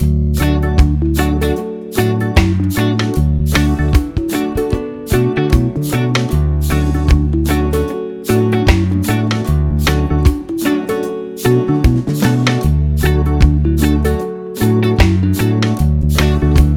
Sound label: Instrumental